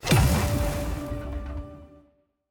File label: Scatter Hit; fruitblaze